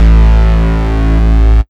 Bass Root A

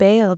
Vocal Oneshots; Vengeance Samples; Vocals

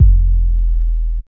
Soft Kick; Vengeance Samples

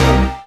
Synth Shot; Vengeance Samples

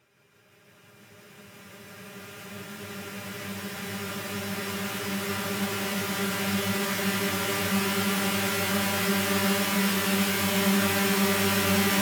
140BPM Dirty Saw G, Melody Fills; 8Bars; Vengeance Samples

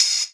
Open Hihats, Cymbals; Vengeance Samples

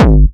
BD Distortion, Bassdrums; Vengeance Samples

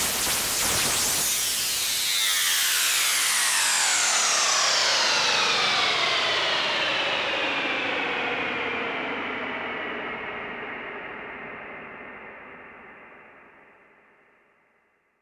Downlifter

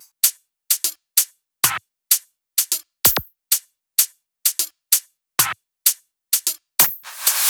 128BPM Drums; Vengeance Samples; Spring Kit

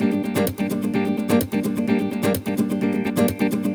Nylon Guitar G min, 128BPM; Vengeance Samples; VEH3 Nylon Guitar Kit 1

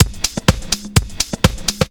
drum loop 125, vocal house; PS-28 Vocal House Samples; drumloops; houseworx

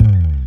Slides; Vengeance Samples; VEH1 FX

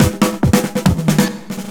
Loops Fills; Vengeance Samples

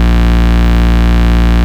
Root A#, Ultimate Bass; Vengeance Samples

